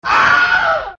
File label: Wilhelm Scream; starwars